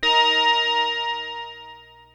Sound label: PPG D#4, synthesizer; Hollywood Edge; Giorgio Moroder Rare Synthesizer Collection; PPG.2.3